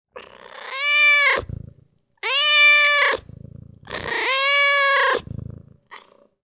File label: Cat Meow